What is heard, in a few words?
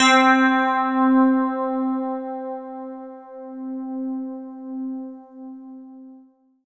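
yamaha fs1r electric piano wide c4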